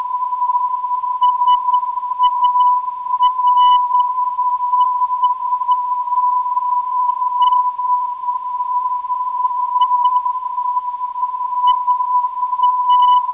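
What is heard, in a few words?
375kHz, non-directional beacon; SAR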